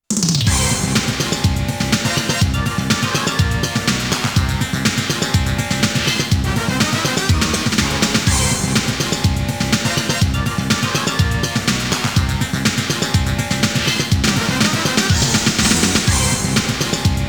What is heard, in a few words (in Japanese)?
ステージセレクトBGM; konami amusement sounds 93 summer